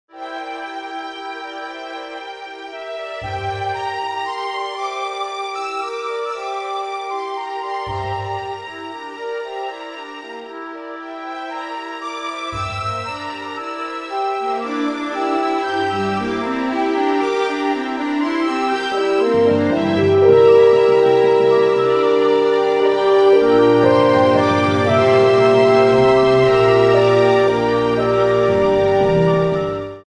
Sylvan for orchestra

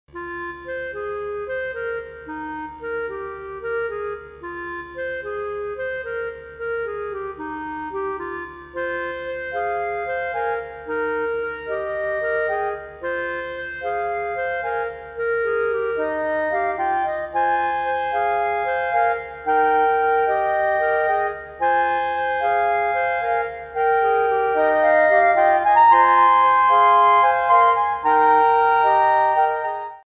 Divisions on Ground 4 clarinets

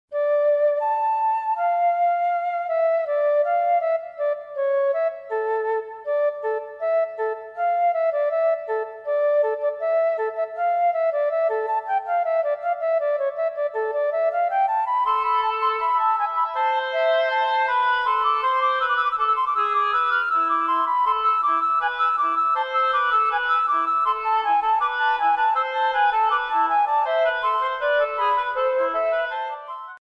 Bach Little Fugue wind quintet

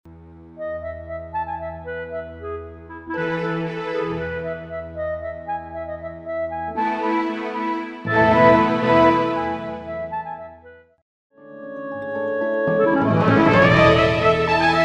Clarinet Conc 3rd movt

